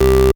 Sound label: Synth Bass; Vengeance Samples; VEE Synth Shots